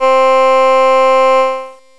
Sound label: Flute; envelope